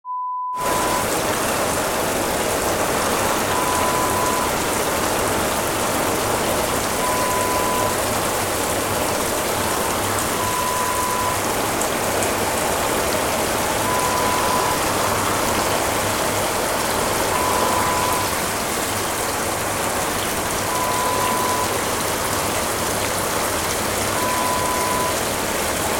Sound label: Bathroom Shower sound